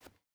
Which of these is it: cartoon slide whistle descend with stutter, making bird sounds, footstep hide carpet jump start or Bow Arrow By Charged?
footstep hide carpet jump start